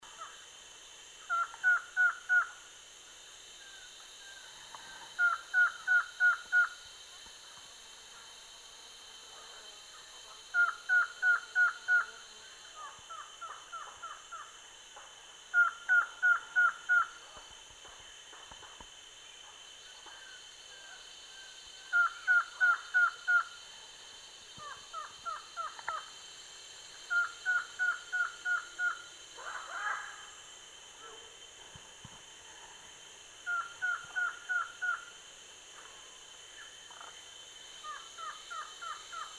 canto da choca lisa

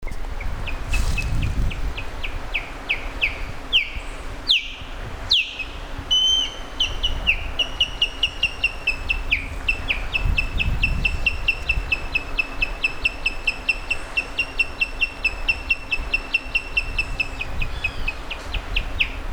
canto da aguia pescadora

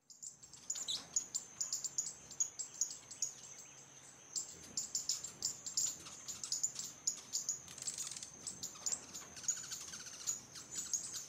Canto do bico reto cinzento

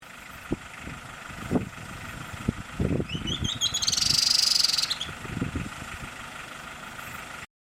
Canto do cinclodes de barriga branca

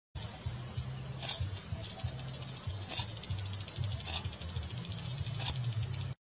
Canto do beija flor de cauda dourada

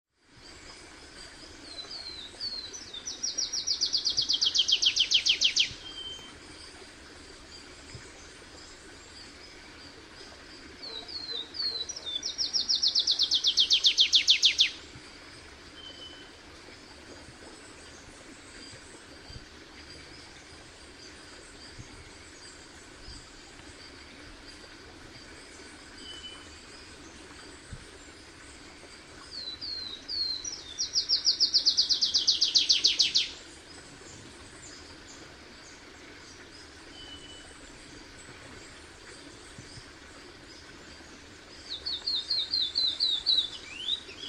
Canto do pula pula de cauda avermelhada

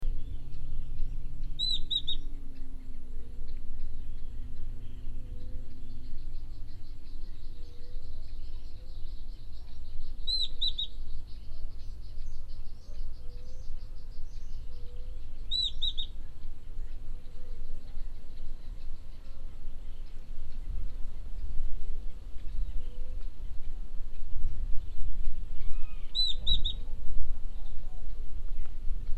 canto do amarelinho da amazonia

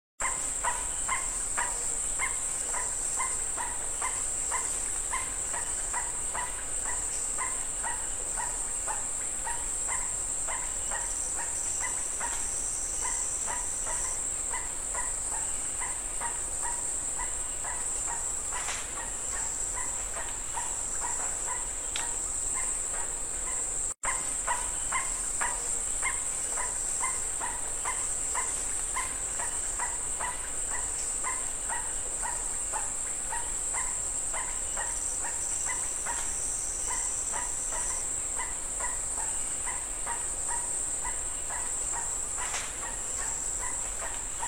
canto do mutum poranga

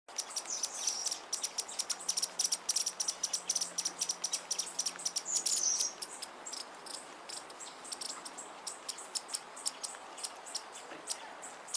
Canto da beija flor de fronte violeta

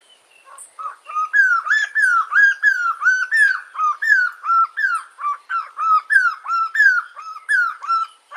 canto do mesite marrom

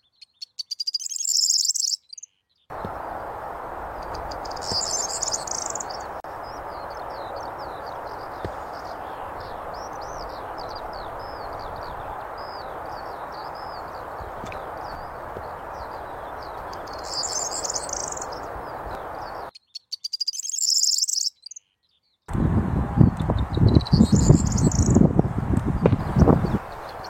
canto do trigueirao

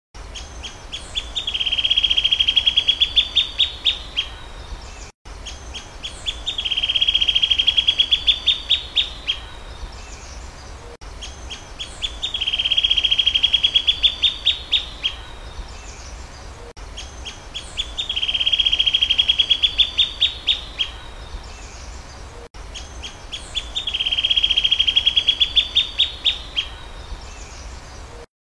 Canto do arapacu rabudo

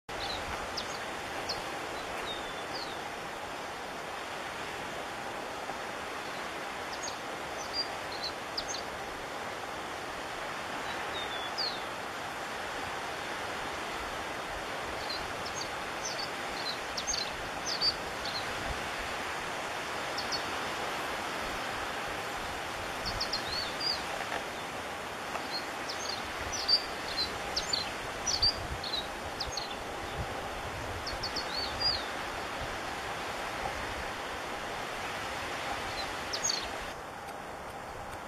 Canto do comesebo patagonico

